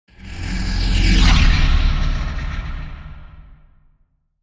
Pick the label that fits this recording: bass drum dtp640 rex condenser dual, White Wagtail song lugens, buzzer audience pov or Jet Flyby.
Jet Flyby